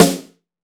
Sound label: Maple Lo Snare; Roland BK-5; Snares